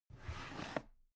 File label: drawer cabinet carton open regular halfway